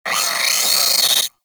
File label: Sentient Pain, Barks; Warframe; Sentients; Brachiolyst